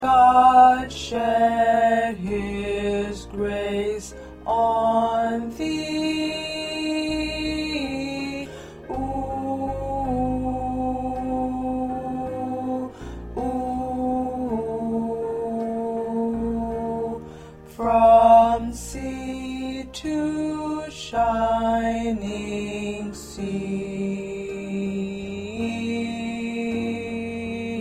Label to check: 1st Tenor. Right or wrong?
Right